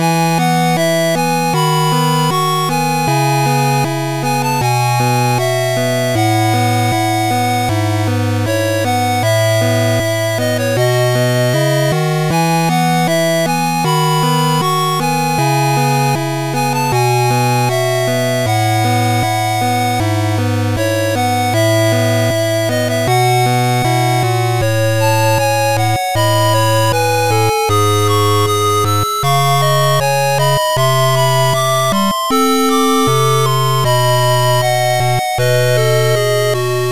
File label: 8bit My Hometown